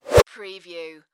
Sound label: Arrow Impact Body; sound effects bible